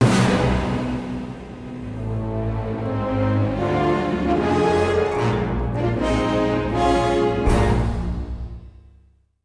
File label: music flourish